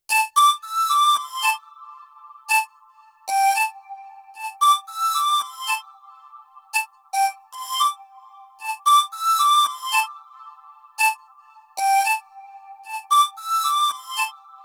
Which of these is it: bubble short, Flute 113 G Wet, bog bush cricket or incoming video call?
Flute 113 G Wet